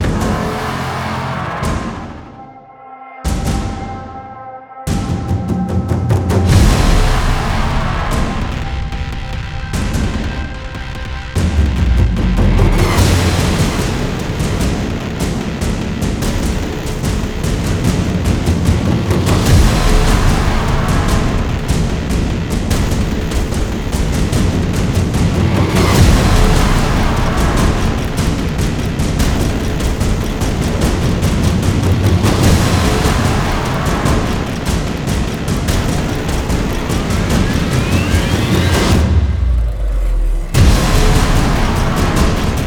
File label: Mechanical Fight, cinematic action drums music; Epikton; cinematic action drums music pack